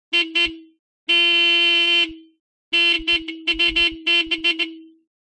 Horn Car Horn 1940

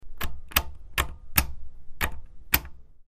Button Pressed Quick, switch clicks

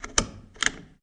Light Switch Rotary; switches

